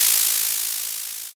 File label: soda